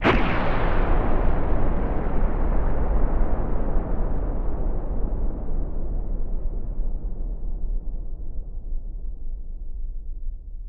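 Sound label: Missile Launch Distant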